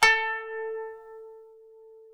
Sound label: KPES A4, KANUN; SoundBytes; Early Patches; KANUN SMAL